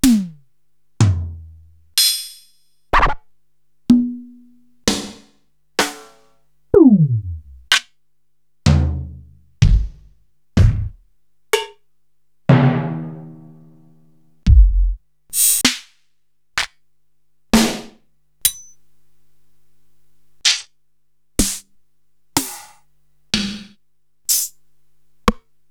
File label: Korg TR-Rack, Samples Sliced; m8